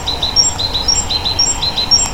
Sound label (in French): Charbonniere chant